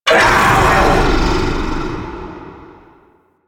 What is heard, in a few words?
Vocal Pre Death; Warframe; Duviri; Dax; Vocal Set A